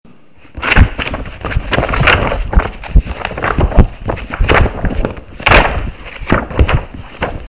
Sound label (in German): blaettern